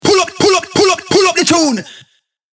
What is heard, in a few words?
Hype Predrop Pull Up The Tune